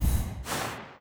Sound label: begin button; Hollow Knight